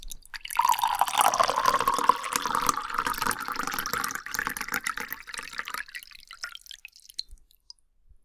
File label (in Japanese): sosogu, water; real